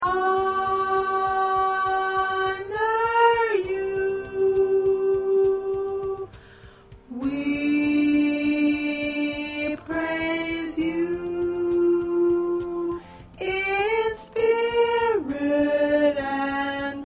P Alto

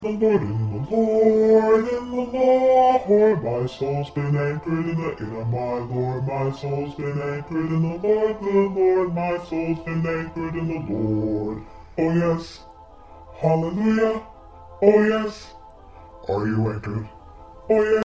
1st Bass

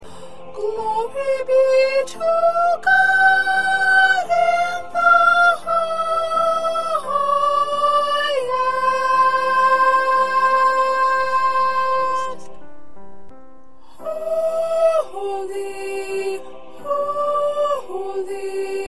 1st Soprano

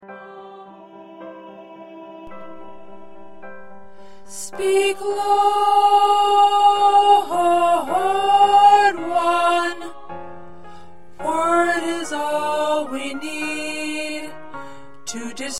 Tenor, choir parts

